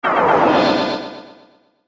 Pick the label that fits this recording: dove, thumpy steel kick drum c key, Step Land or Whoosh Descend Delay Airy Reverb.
Whoosh Descend Delay Airy Reverb